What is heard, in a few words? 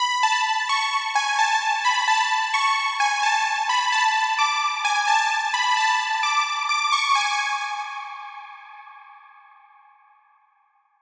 Melody Lead Synthwave Synth Pop; midi wizard